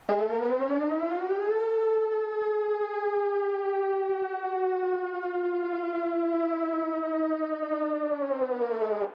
luftalarm

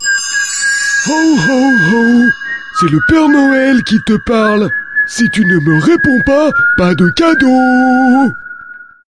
julemanden

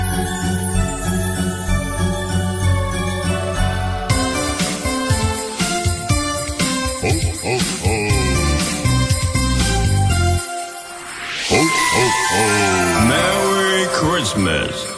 Jule Jingle ringetone

